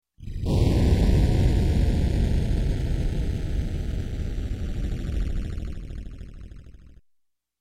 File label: Space Fizzle, Electronic; Sound Ideas 2000 Series